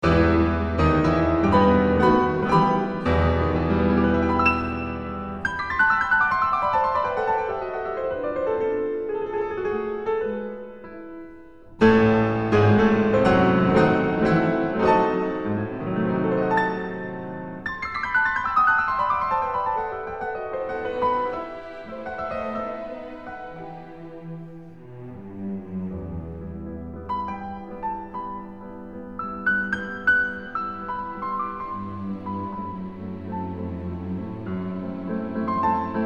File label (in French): concerto pour piano; chopin; Martha Argerich; dutoit